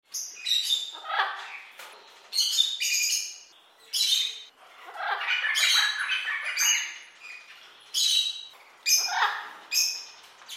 parrots

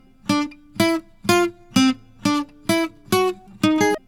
guitar

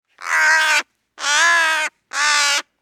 crow

